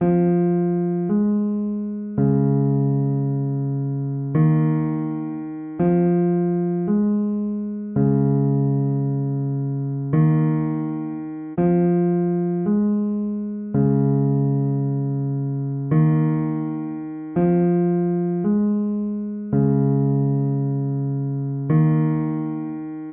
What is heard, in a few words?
166 G grand piano